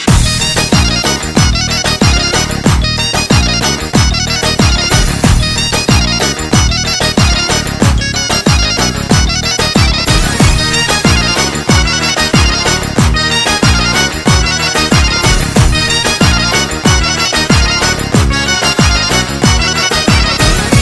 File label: Balkan Turbo Folk